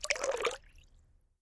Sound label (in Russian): voda kupanie myagkij korotkij